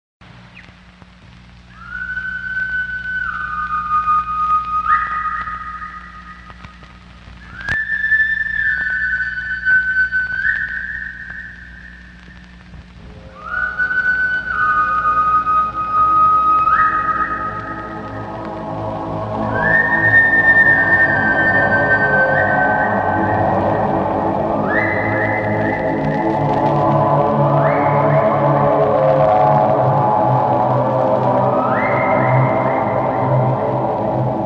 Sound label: Horror Soundtrack; Just before dawn 1981